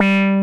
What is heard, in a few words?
ACI WAAH BS, TECHNO; Pro-Rec - Synthsonic; Z1 TECHNO